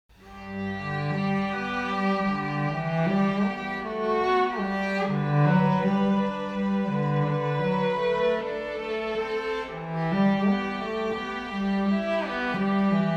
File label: Strings Ave Maria